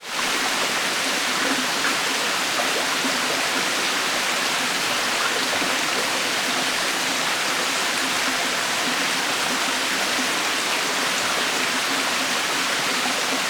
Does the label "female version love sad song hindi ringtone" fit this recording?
No